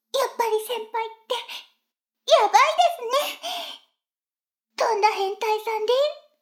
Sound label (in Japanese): シコシコ音声; 逆時間停止されて